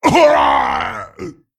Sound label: Male Abomination Death